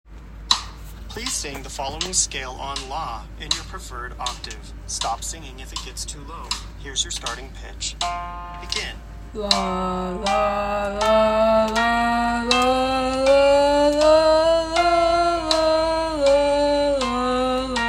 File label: Tenor